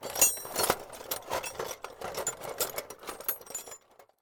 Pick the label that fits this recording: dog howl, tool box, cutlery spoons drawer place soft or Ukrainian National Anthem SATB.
tool box